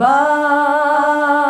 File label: "BAH" D, FEMALES; Best Service; Hyper Dance